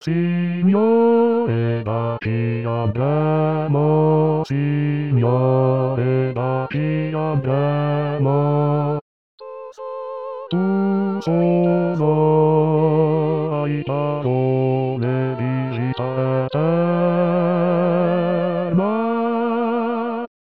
Signore Da Chi Andremo Bassi; anno liturgico

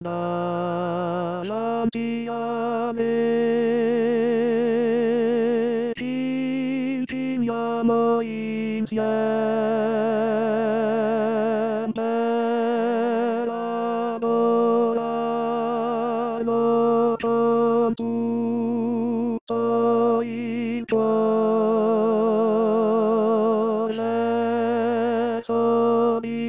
Tenori

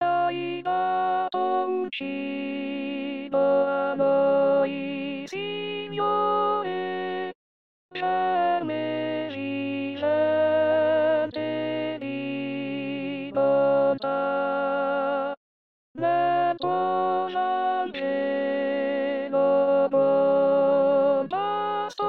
Hai Dato Un Cibo Contralti

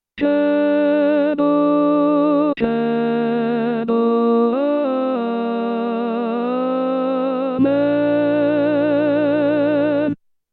Credo Tenori; anno liturgico

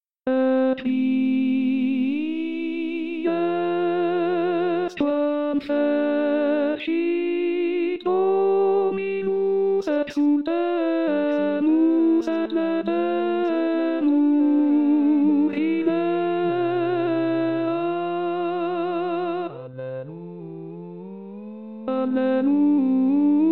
Tenori; Haec Dies; Pasqua

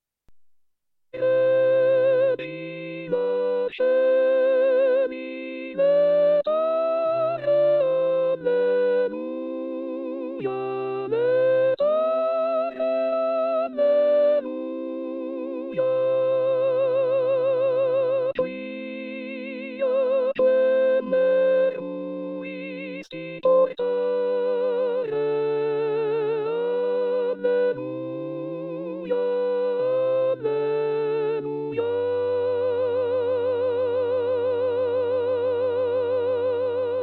soprani; Regina Coeli; Lotti; pasqua

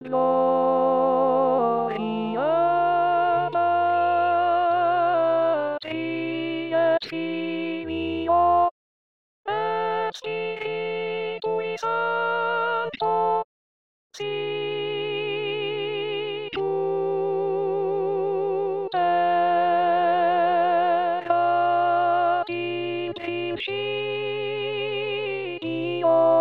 Laudate Dominum Contralti; anno liturgico; Mozart

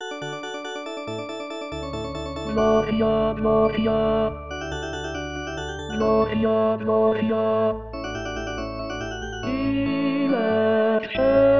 Gloria Tenori; Vivaldi; anno liturgico

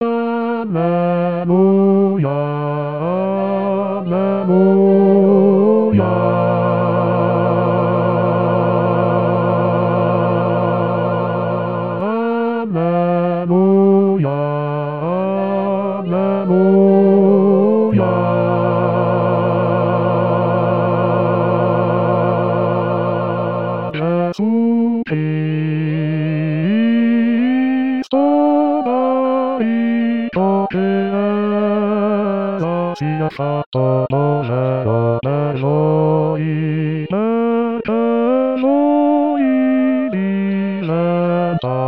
Canto Al Vangelo Bassi

